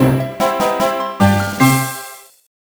Beat Boss; Barbie as the Princess and the Pauper; GBA

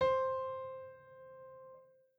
piano c2

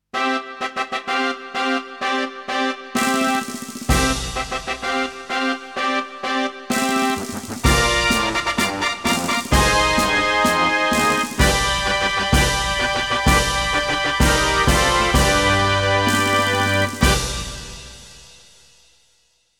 Fanfare of the Special Race; Winning Post

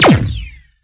Shot Small Laser Recon Pistol

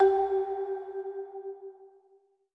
marimba; Sky Children of the Light gamerip

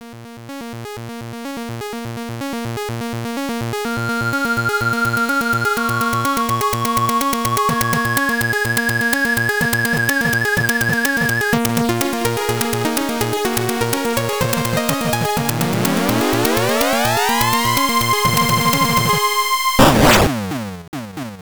Intro (with SFX); Teleroboxer; Virtual Boy

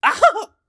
death hit; Collector; Hollow Knight

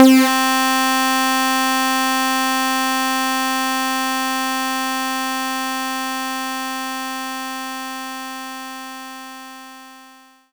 Stacked Saw; knights try

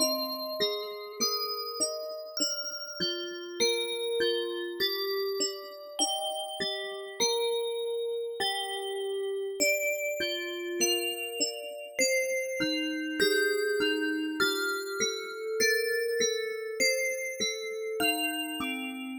bells; Toki Tori Collection

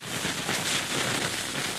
Fuse; Blackeye

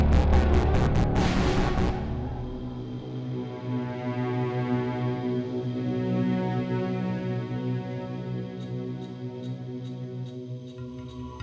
Intense; Act 6 - Desert Drop Off

